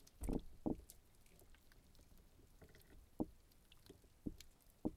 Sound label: Tautoga onitis; soniferous